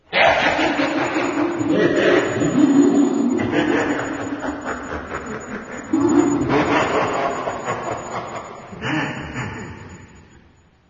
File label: soulsand, ambient